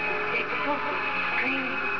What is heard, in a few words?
dead scream